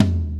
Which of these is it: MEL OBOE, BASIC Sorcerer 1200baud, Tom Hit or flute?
Tom Hit